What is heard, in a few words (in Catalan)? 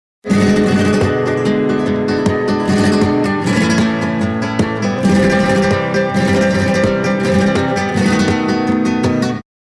Dictat Harmonic Flamenc